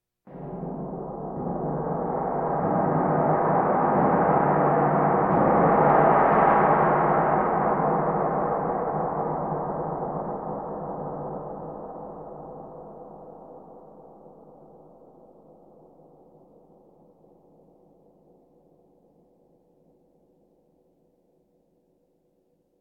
Percussion Bongos Tympani rolls Gongs Drums

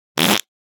Fart Foley Short And Healthful; Hollywood Edge; sound designer's toolkit v2

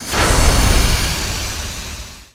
Hatch Large Metal Open; SFX Kit; Doors

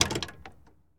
Truck Explorer Hood Latch; Trucks

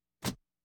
Automobiles various

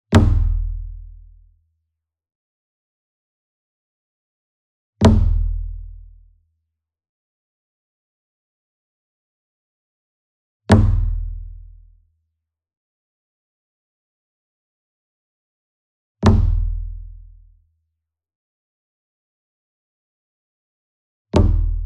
SINGLE DRUM Kodo Flam Soft Beater Near; boom library; Cinematic Strikes Construction Kit

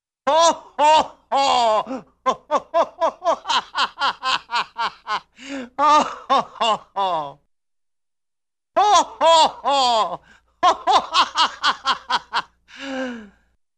Funny Man Laughs 'haw-haw' Type, Cartoon; Hollywood Edge; Cartoon Trax

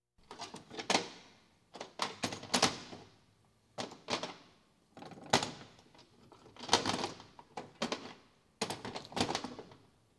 Police, Fire engines

